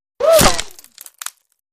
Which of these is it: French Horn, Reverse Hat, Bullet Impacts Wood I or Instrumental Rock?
Bullet Impacts Wood I